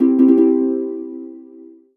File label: MUSIC EFFECT Solo Harp Positive; startide macos switch windows gamerip 2017